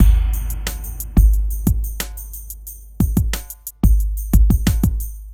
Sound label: Beat, Hip-Hop